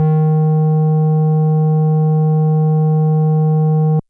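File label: FM Sq Dist DX100 D#5; square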